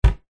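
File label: household bathroom cupboard door close thud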